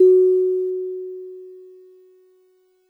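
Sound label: DreamLike DX100 F sharp -1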